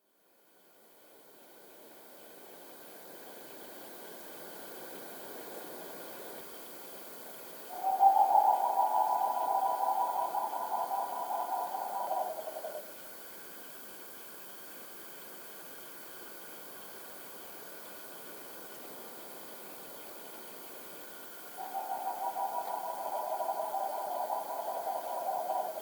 Green ibis; پرنده اکراس سبز

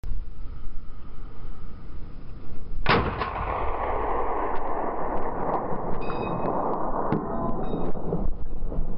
sniper

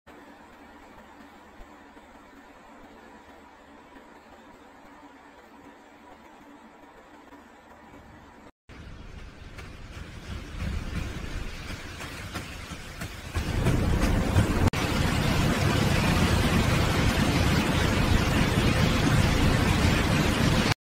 Avalanche

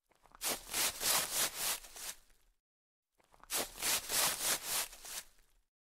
bush; خش خش بوته ها

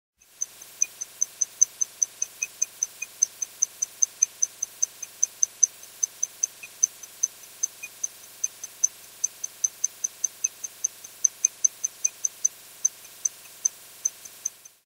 pocket watch

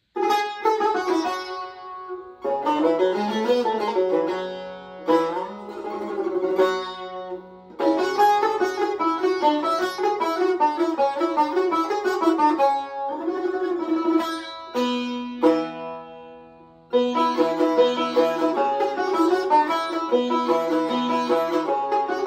tar instrument

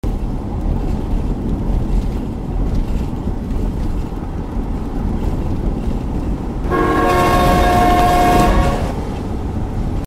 fiam cadillac horn; فیام کادیلاکی

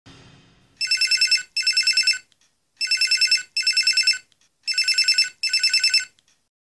blackberry ringtone

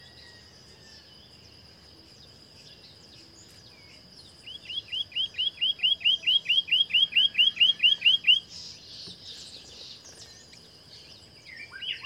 Scrub greenlet

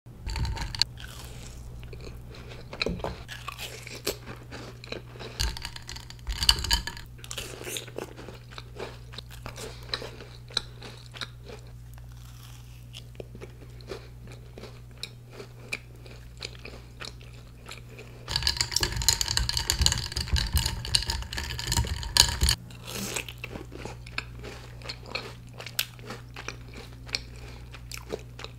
eating cookies

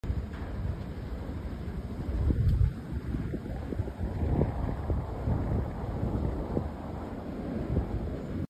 windmill; آسیاب بادی

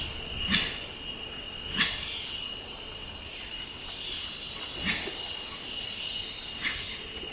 Grey winged trumpeter

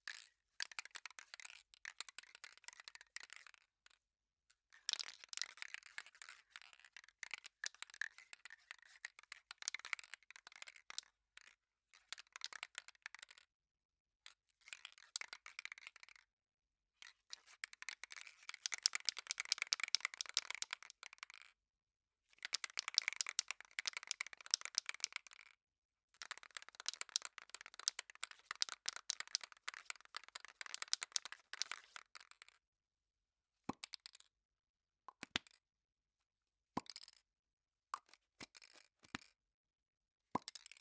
Shake spray

